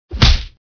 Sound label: punch